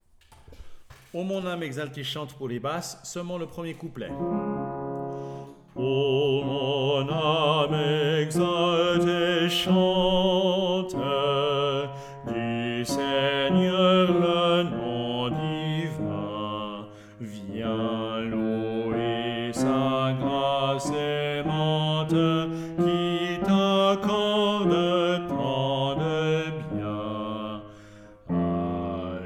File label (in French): Chants O mon ame exalte et chante B